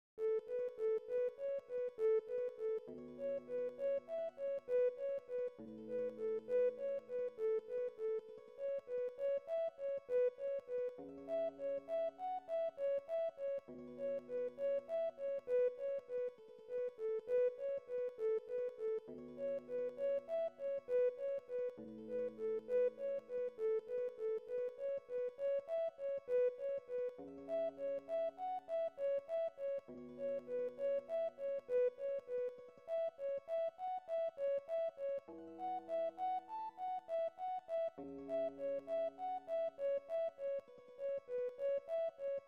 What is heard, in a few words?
guitar recorder tubular bells